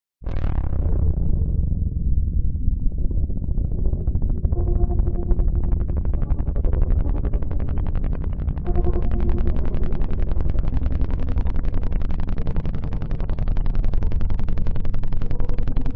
Deep Mov SFX, High quality sub bass tone for tense or creepy film scenes.; Deep Move SFX